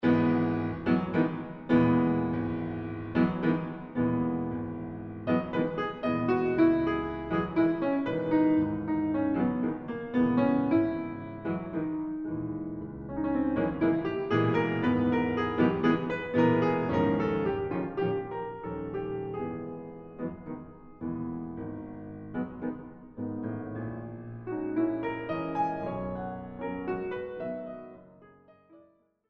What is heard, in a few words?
Hesitation Tango